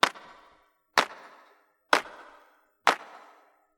claps only; Drum loops

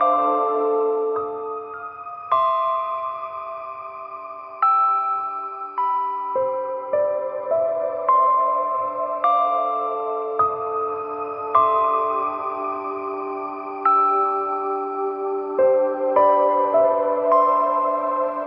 piano and keys, Sad Loops

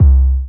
Kicks; Heartbeats

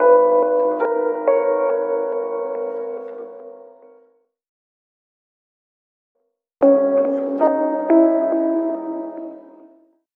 piano, sad; loops

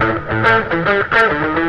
guitar, Phonky Loops

